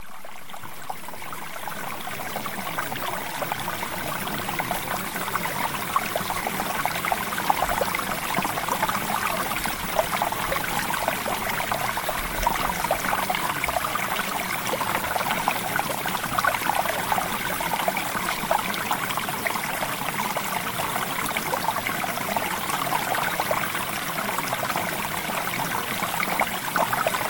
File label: Water